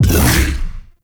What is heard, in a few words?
Bowl Jump B; Warframe; Grendel